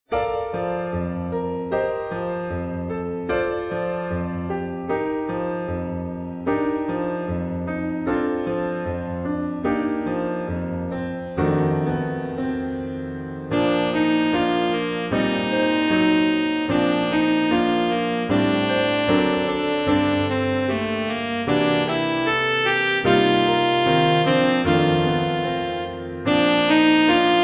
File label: Romance w Sax; Part 1 Full